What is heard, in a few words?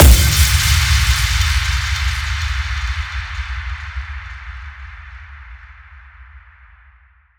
impact shatter; Virtual Riot